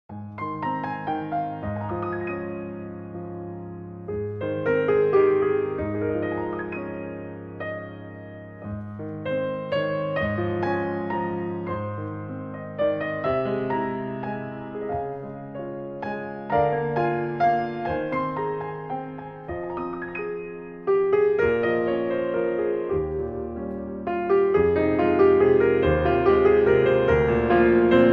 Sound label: Sacred Arrangements For Piano